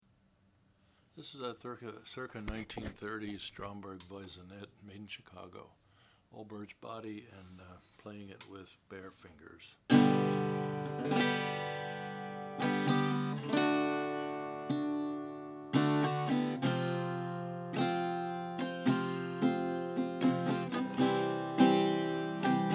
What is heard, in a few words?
stromberg voisinet hawaiian red stencil